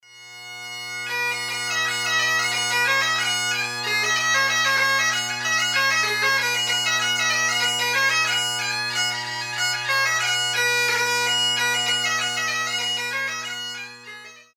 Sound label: Hornpipe; Duncan Johnstone